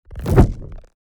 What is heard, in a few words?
Energy flow fast particles movement